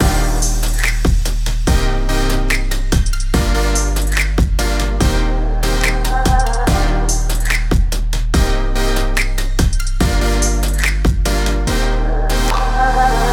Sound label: SEM Dry; filters bundle